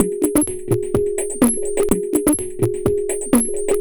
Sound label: Beats, Synchro Funk; Sublife; Sonic Foundry (Sony Creative Software)